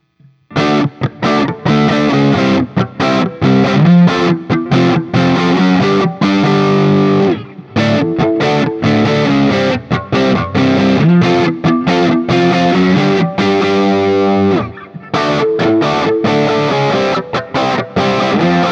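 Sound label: Guild Starfire I SC JCM800 HB A, guitar; Guild Newark St Starfire SC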